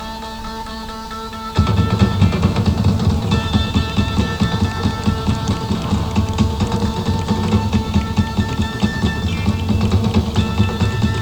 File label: Drum Solo; Kansas City 1975